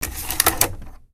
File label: gear